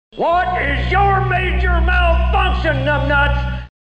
Malfunction

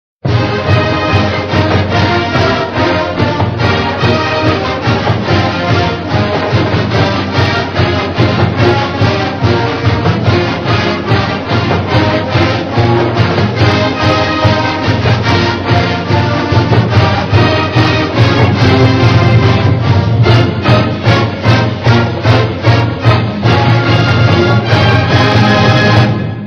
Fight Song; Maryland

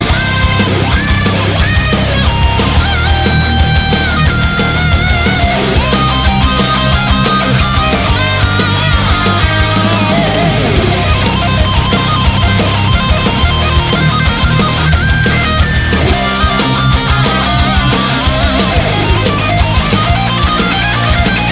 Guitar Solo

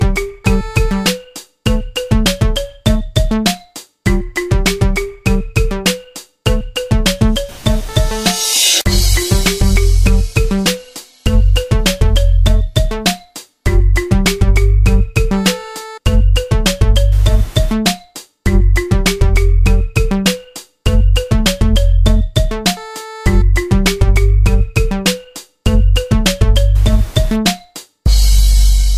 Xylophone Instrument

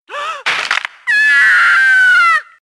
Ladybrakes; Gta 4

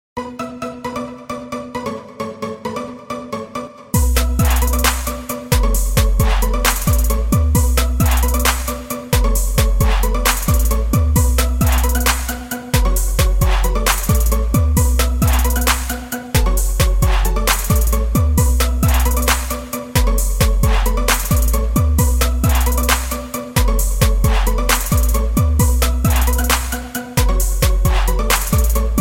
Trap Ring

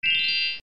alert tones; Yahoo

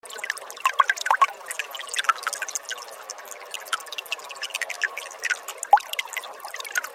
Water Drop

